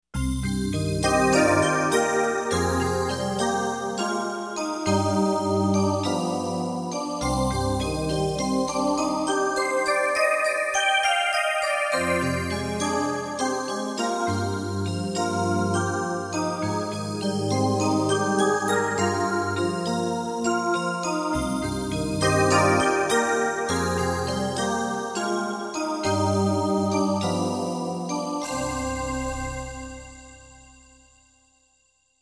vc 1 staccato heaven

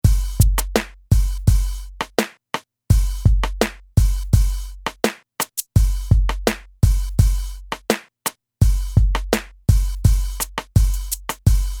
beat

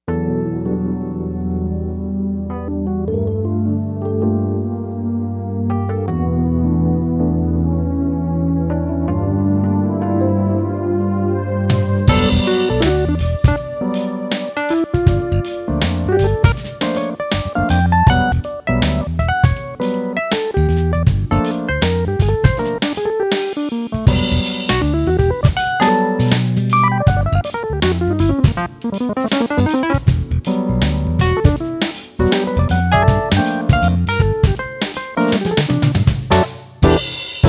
el piano; fp 2

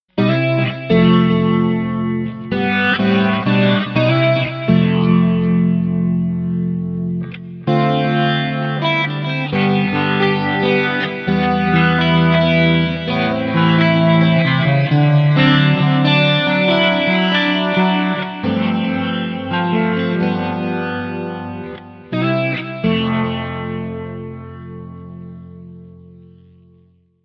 layer sound

